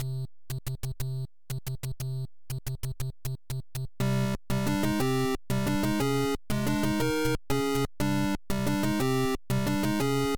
Airship Theme; SMM2; SMB

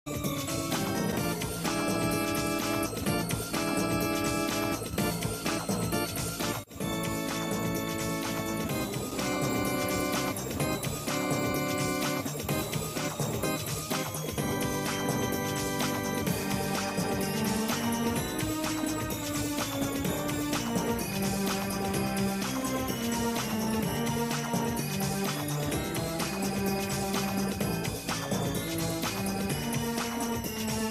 Castle Theme; SMR; Coin Rush

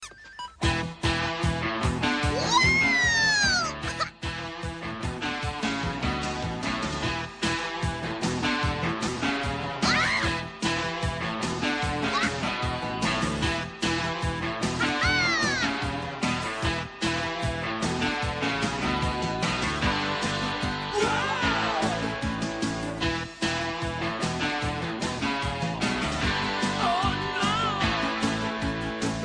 Instrumental

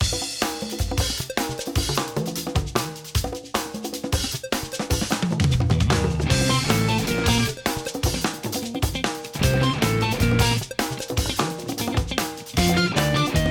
Underground BGM Jazz Fusion Arrangement; SMB

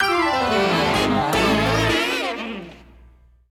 Battle Intro Low Health

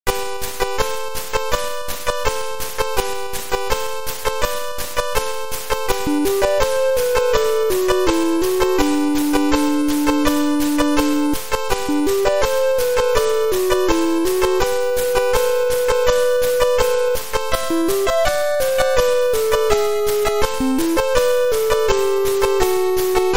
Snow Theme; SMM2; SMB

